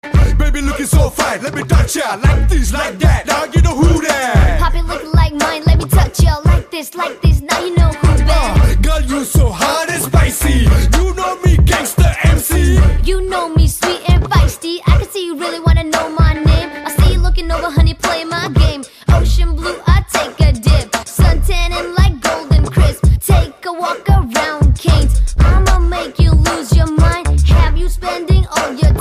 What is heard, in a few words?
Rap